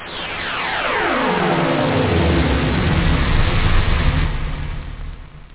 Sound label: whoosh; da capo vol1